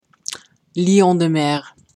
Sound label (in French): Lion de mer